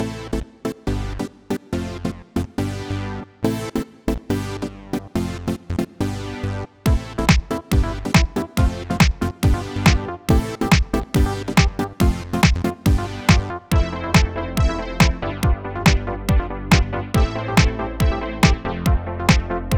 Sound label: Disco